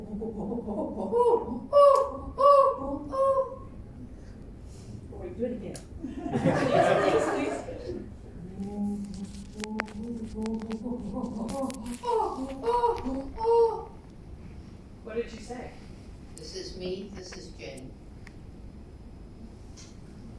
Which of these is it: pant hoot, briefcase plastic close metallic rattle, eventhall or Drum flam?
pant hoot